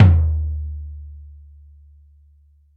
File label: deep tom drum sound e key, Royality free tom drum one shot tuned to the E note; tom drum samples